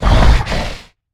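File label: Stealth Attack Grapple; Evolve; Goliath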